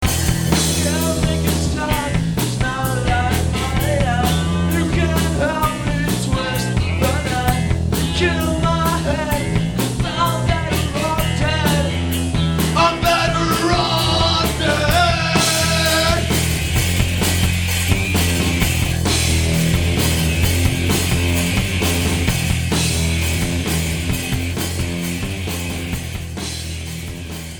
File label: buzz